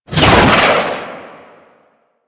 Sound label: Archgun Projectile By; Warframe; Archwing